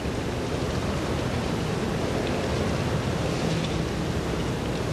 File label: Wind Loop; Silent Hill Downpour